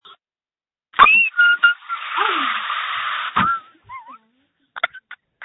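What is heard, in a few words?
screams that feature laughter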